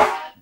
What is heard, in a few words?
SD, dance drums hip